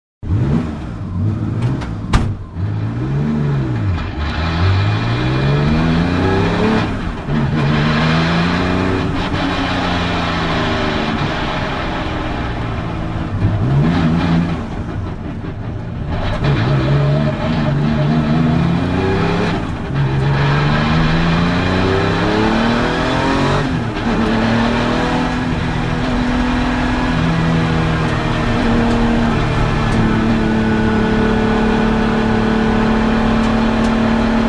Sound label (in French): 993 GT2 a bord; porsche